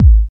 raw steel kick drum a key, bass drum sound tuned to the A note; kick drum samples